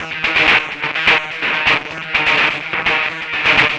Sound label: Drums Strange